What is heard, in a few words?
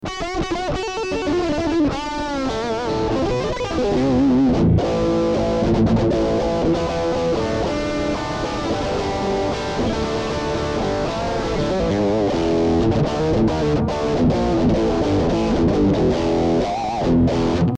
LOWER MID ROCK GAIN DIRTY